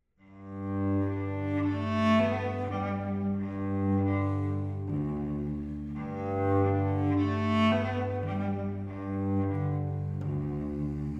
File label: Improvisation